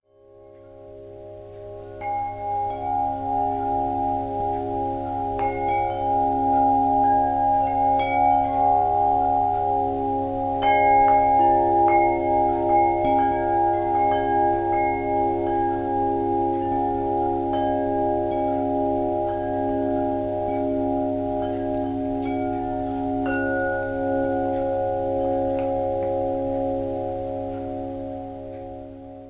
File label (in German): OM MANI PADME HUM Klangvoll